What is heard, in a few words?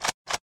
lewis gun switch; weapons